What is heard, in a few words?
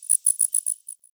Wetland Forest Day Insect Close